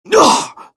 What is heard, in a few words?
chen pain